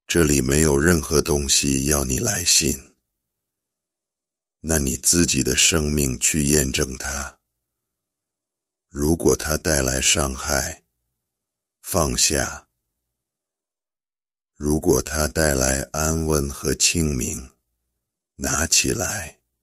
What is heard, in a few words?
drop, remix